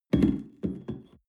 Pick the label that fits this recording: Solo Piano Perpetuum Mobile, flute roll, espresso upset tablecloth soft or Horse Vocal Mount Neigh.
espresso upset tablecloth soft